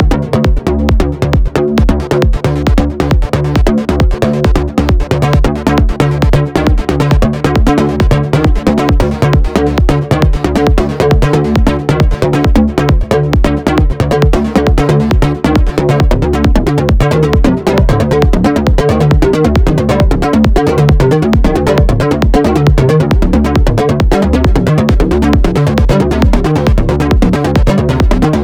melodic enchantment

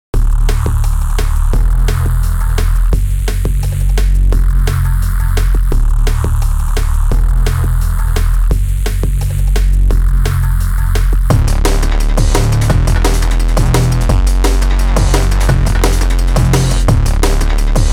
bass; gorilla bass

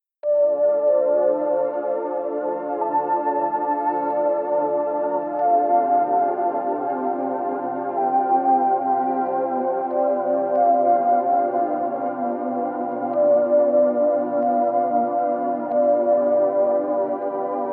vhs dreamwaves

